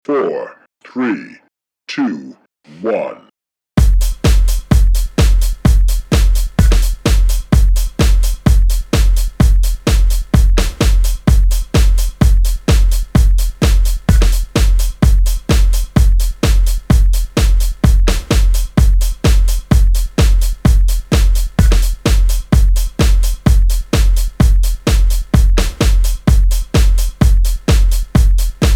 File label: beat